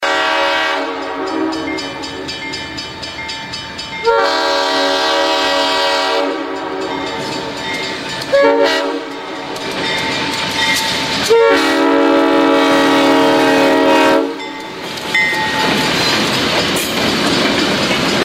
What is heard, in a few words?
train horn bell